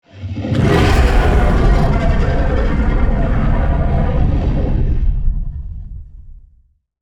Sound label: T Rex dinosaur roar pain